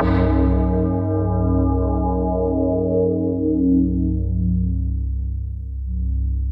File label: DARK BELL; Pro-Rec - Synthsonic; JV FILM